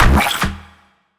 bass shot

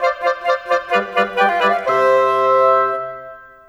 Cinematic Winds; Sonic Foundry (Sony Creative Software); Orchestral Series VOL-3; Cinematic 21

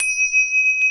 CYM; RAMACYM; Roland - LA Composer vol. 1

